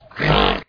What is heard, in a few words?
horse